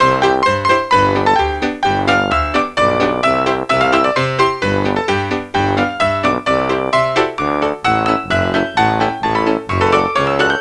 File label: Kids Music